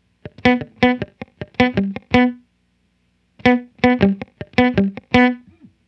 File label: HipHop Guitar Dmaj; Digidesign; Pro Tools; Pro Tools Essential Loops